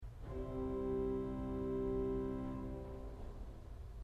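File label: dolce 8 C, organ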